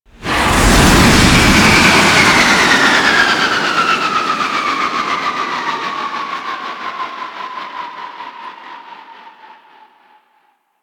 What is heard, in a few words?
Asteroid Field Ship Stop; Warframe; Ambience; The War Within